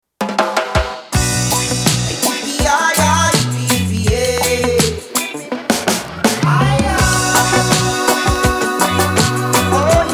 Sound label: reggae